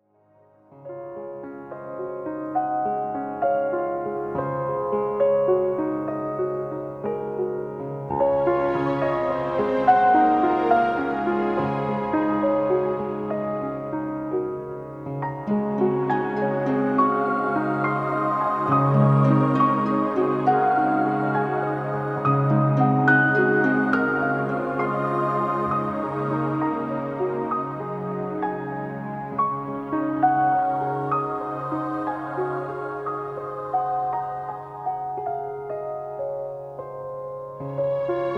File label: Meditation; relaxation gentle whispers